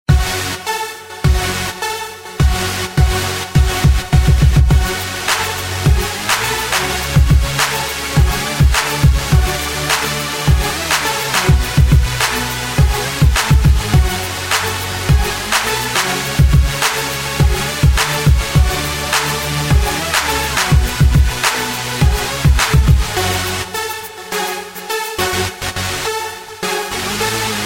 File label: hip hop beat